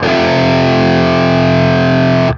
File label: 5TH F, Guitar; East West; Steve Stevens Guitar Samples Collection